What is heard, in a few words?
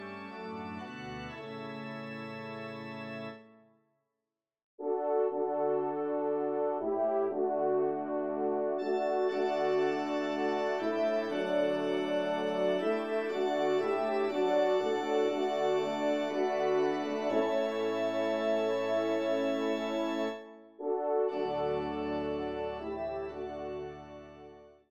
Elevation Trp Org; messe cantin